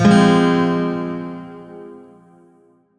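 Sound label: Shawzin Minor Chord; Music; Warframe; Lotus Shawzin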